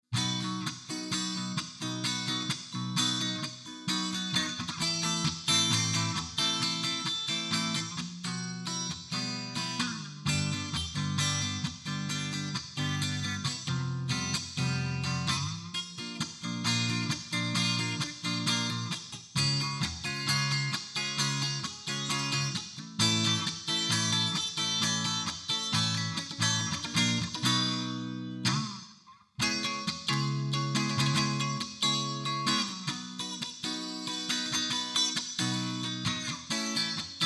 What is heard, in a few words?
acoustic